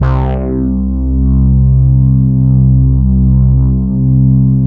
Oscar bass

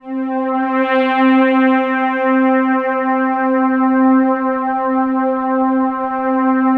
DENSE FL, PADS; Masterbits; Vintage Voltage

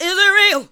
ADLIBS 90; Ueberschall; Jam Box

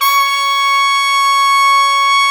C#4, Section Horns

